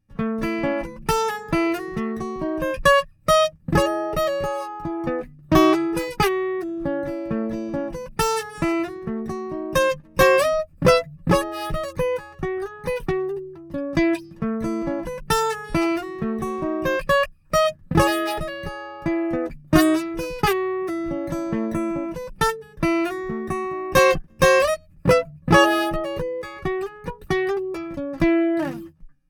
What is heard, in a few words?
HD28 AKG C414B XLS, guitars